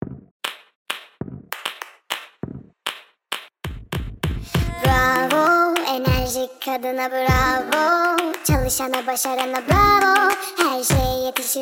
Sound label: sped up